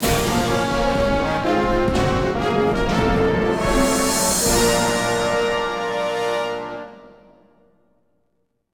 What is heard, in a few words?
Combat Win; Combat Reg4 Win 03